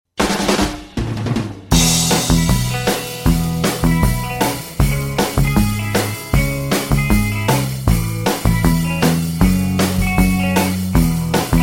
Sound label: happy